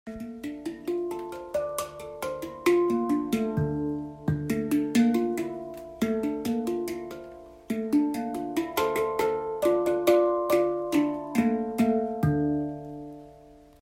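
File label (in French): 9 notes ré mineur, Bol Chantant Tibétain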